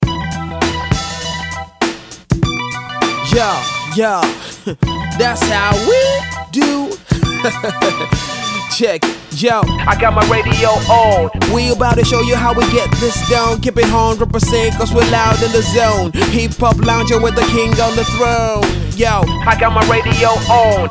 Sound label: Hip Hop Lounge Jingle; hip hop lounge soundtrack